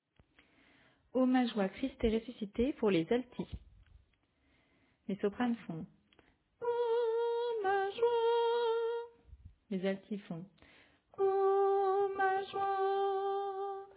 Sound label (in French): Alto; St-Jean-Baptiste-de-la-Ste-Famille